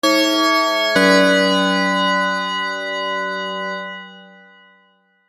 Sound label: Strange Alarm Bells